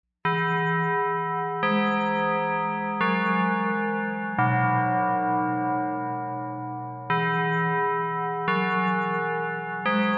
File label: bell chimes